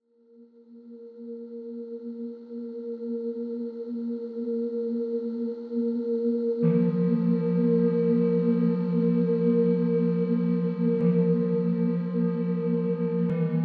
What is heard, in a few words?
Birdsong